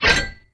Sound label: armor